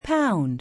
pound, Keytone sounds; LG KE990 Viewty

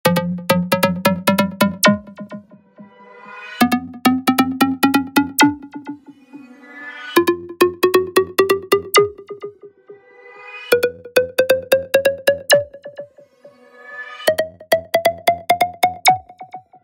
Bouncey Bounce, Nokia Tones; Windows Phone apps

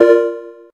electric, keytone

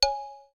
Volume, ui; Asus Zenfone-10

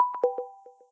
hangup; Samsung Galaxy S10

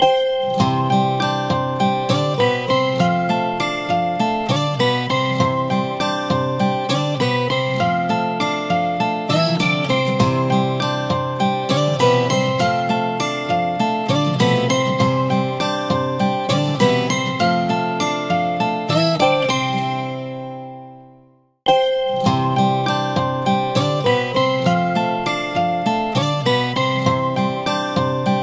ringtones; LG; Smart Folder X100S; Evening Glow

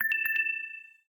Low Battery

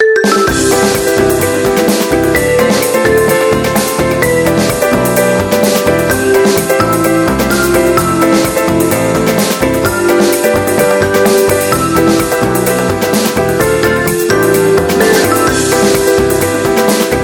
ringtone; SK telesys SK-S150

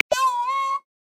Green Peacock; Xiaomi Redmi-Note-8-Pro; South West Mountain

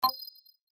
stop rec, camera; Sony Ericsson Xperia Play

